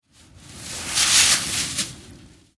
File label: Sports Snowboard Pass By; Skiing and Snowboarding